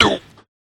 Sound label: Enemy Kill Sound; DoughnutQuest